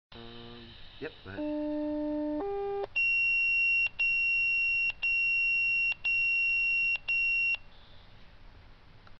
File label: Tone A, Watchdog Alert; OSI